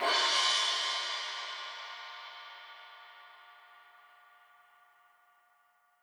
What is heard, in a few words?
Crash Energy; percussion; Cymatics - Infinity Beta Pack 2.0; Zeus Percussion Collection